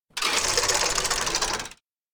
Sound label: row slow; anemoi